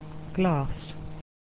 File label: glass